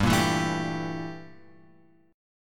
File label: G Minor 6th Add 9th G; Gm6add9 Chord {3 1 2 2 X 3} strummed